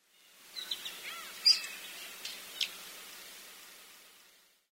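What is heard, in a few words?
Asian short-toed lark, sakata; himekotenshi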